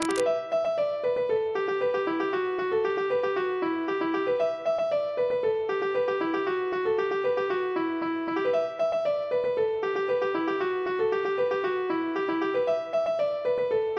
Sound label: polka; boeuf trad